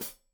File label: Hat Foot, Hi-Hat, Cymbals Stereo